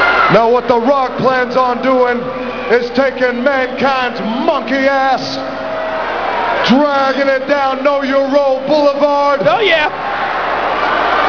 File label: rock